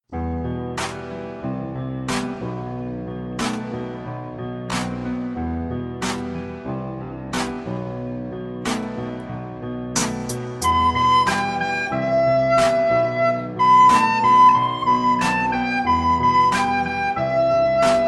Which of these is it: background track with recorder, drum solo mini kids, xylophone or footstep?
background track with recorder